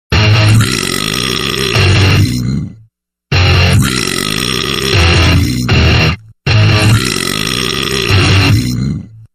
Guitar Burp

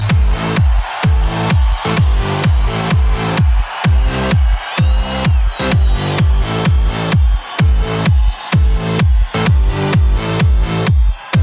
Lalalala Hardbeat

